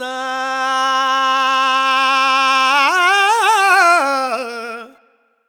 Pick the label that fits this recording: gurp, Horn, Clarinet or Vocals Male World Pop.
Vocals Male World Pop